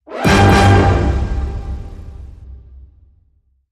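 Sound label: Hit Orchestra Double G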